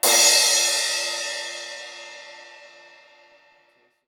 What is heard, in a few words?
sabian crash